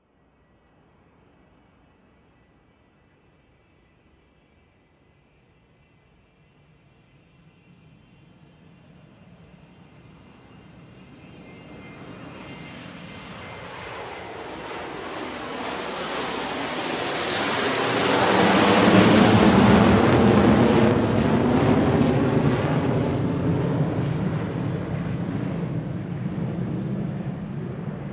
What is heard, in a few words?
Jet Take Off; SOUND EFFECTS1